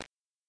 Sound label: Instrument